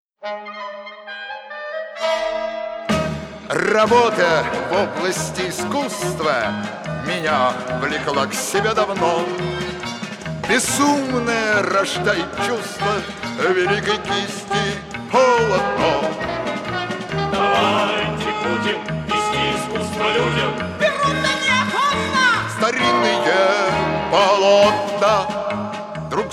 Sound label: Stamp song